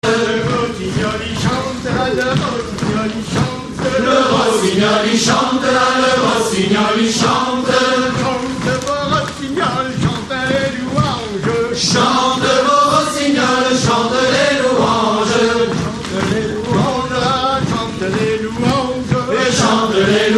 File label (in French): chants dans la ronde recueillis en haute bretagne